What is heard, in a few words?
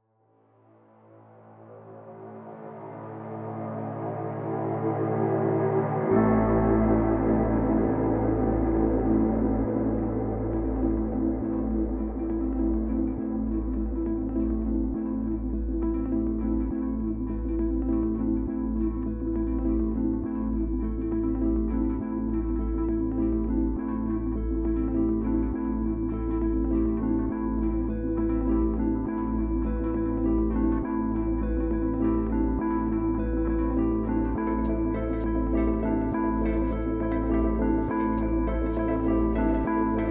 Minimal Electro